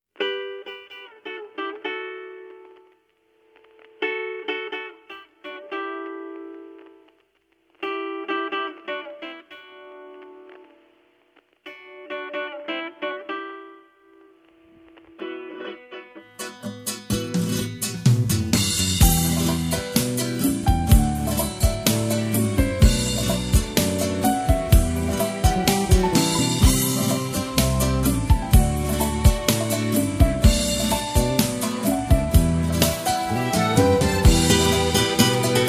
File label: instrumental, persian